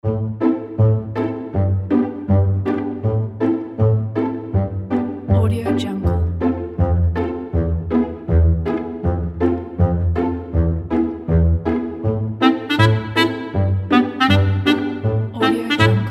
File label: bold cartoon character